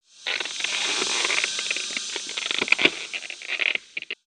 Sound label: Ice Crackle